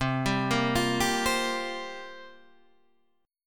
C 7th Suspended 4th, Guitar Drop D, chord

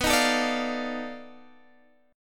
B 7th Sharp 9th Flat 5th, down, Guitar, Open G

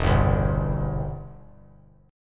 E Minor Sharp 5th E down; Em#5 strummed; JGuitar

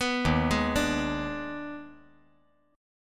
Eb Major 7th Suspended 4th Sharp 5th Eb; arpeggiated; EbM7sus4#5

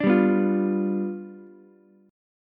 G 7th G down Ukulele; G7 strummed; JGuitar

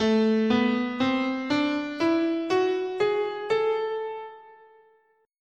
A Melodic Minor

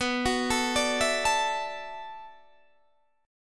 B Minor 11th B down Guitar DADGAD; arpeggiated

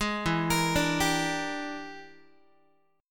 E 7th Sharp 9th Flat 5th, Guitar; arpeggiated; chord; JGuitar

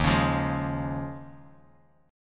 Eb 7th Suspended 2nd Suspended 4th, Bass Standard; strummed; chord

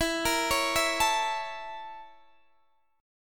A sharp Minor Major 7th Flat 5th

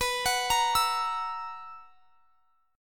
B Major 7th Suspended 4th B down; arpeggiated

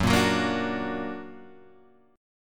F sharp Augmented F sharp Guitar Open G; 4,3,4,3,3,4

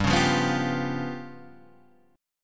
G 7th Flat 5th G down, chord; strummed; JGuitar

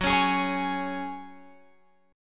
Gb Suspended 2nd, Guitar, Open F; Gbsus2 strummed; x,13,13,15,16,15

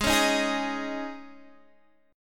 Db Suspended 2nd Ab, chord

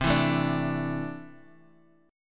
C 6th Add 9th C down; C6add9 strummed

